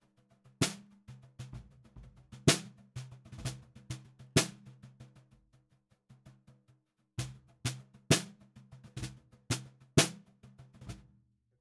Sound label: Snare Bottom